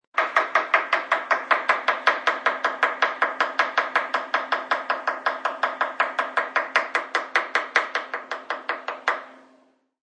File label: Chef uses large knife to cut on cutting board.; Chef Knife Cutting In Kitchen; Food Prep